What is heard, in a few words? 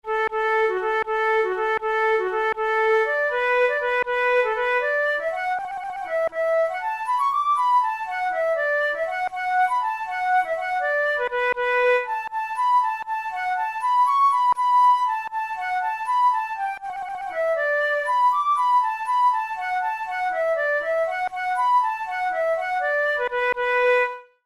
jig